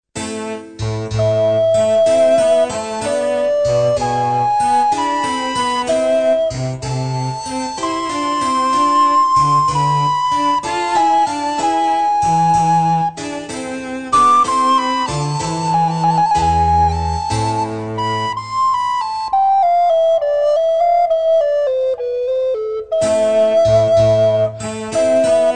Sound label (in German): sonate nr 2 in a moll i larghetto